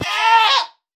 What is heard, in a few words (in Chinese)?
山羊尖叫受伤声